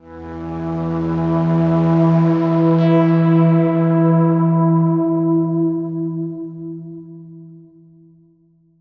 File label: Synth E Long; torchlight iii; ps4